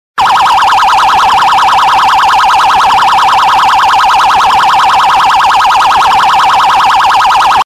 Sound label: omsz sirena, Sirene; deathmatch; [BVR]Sirene; POLICIAL